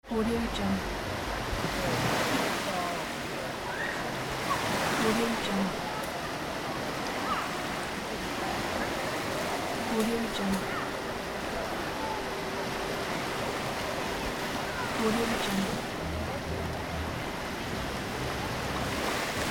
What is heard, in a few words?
beach people ambience; n-audio